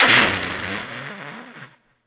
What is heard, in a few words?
ghost witch voice hiss